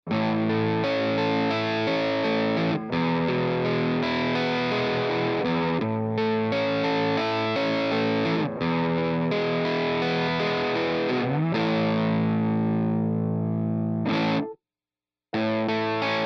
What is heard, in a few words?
Celestion Greenback